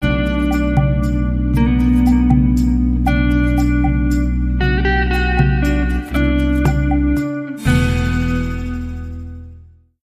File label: This Old Man